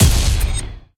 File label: explosion; Road-Fight